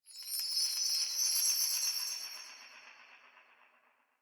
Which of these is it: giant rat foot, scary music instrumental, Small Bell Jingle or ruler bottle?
Small Bell Jingle